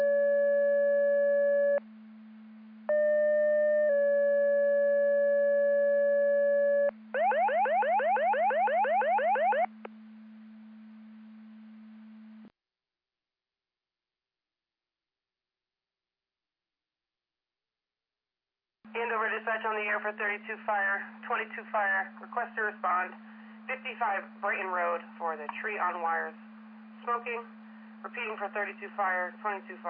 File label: Siren; Andover Twp Boro